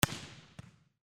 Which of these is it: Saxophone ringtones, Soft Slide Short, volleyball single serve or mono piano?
volleyball single serve